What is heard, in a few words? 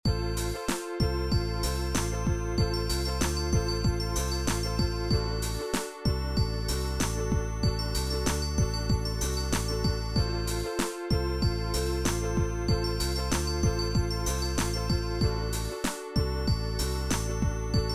fretless bass